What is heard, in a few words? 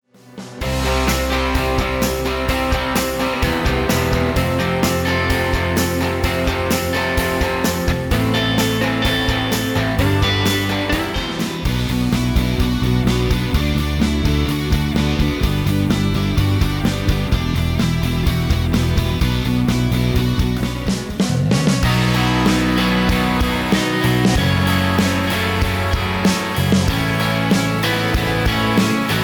pop guitars